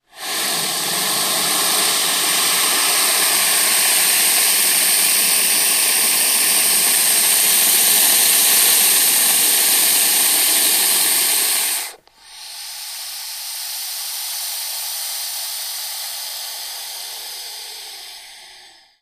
Python, Burmese Hiss. Long Hiss With Inhale. Close Perspective.